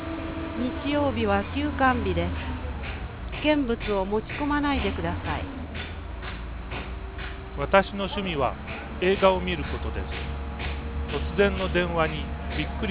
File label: const, noise canceller